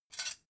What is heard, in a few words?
dough hook grab; industrie-wirtschaft; gastronomie